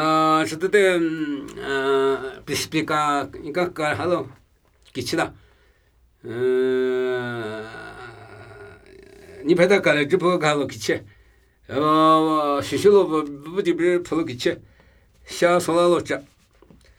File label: Namuyi Tibetan Ritual Chanting